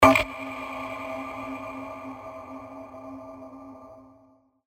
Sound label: percussion C; Twister; revealed percussion vol 2